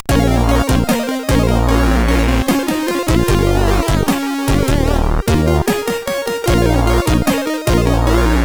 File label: Blash, sid CSG8580R5